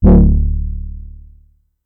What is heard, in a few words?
Synth oneshot D, Synth Bass One Shots; Slate Digital BASSHOLE Sample Pack